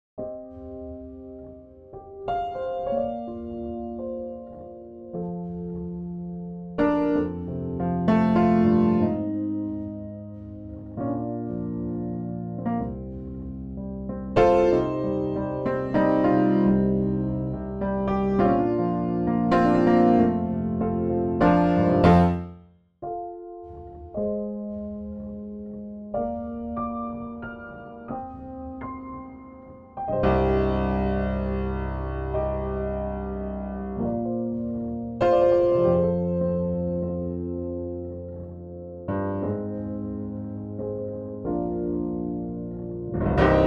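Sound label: warm, Pianoteq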